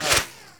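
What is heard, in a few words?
bullet left to right, nearmiss; arccw go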